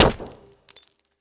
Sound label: gambling shoot round up; Wild Guns